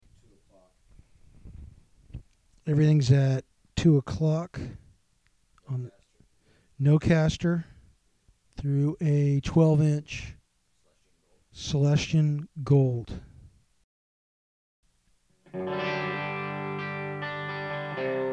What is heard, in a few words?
clean